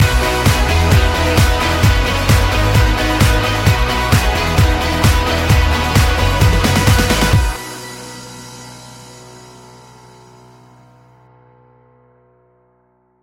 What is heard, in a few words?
neon guitars